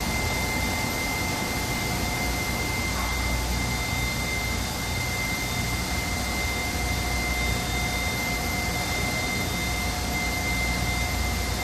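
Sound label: Petroleum Plant Whine, factory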